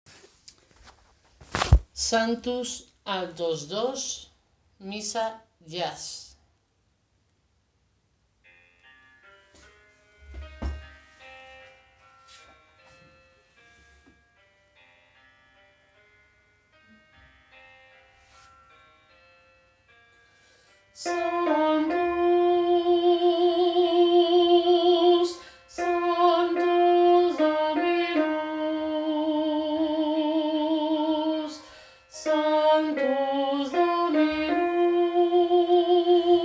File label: Sanctus Alto